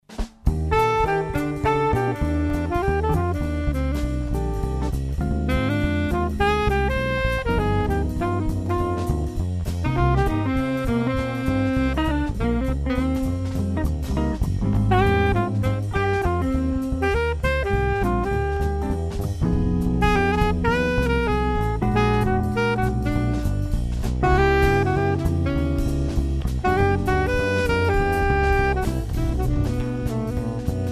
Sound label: Quartet; Sherazade